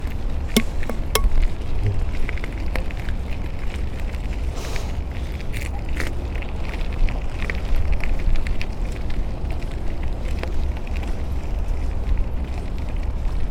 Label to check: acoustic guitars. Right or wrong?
Wrong